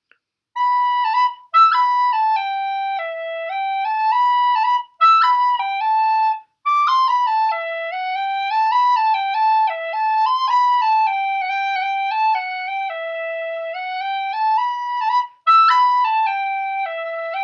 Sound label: waltz